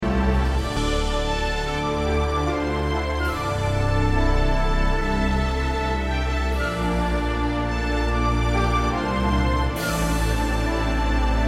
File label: dramatic